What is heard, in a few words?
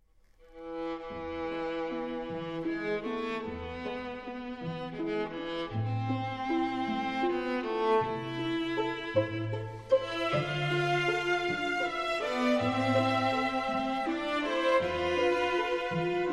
chamber orchestra; romanian radio chamber orchestra; cristian brancusi conducting; the danube waves; ivanovici